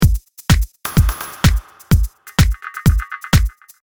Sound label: Beat 127BPM